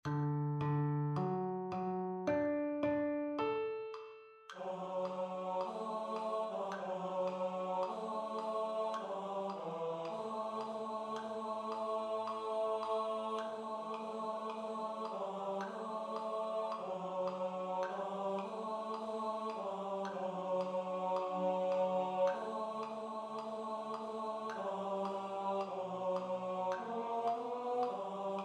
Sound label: TENOR STUDY